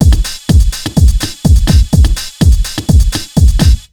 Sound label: BEAT, Disco House